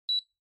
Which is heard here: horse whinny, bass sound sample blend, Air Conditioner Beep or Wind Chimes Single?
Air Conditioner Beep